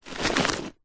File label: footstep single boot scuff on dirt track grit stones; foley; zapsplat